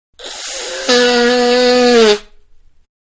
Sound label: Blow Nose; Sick; Human; Manhattan Production Music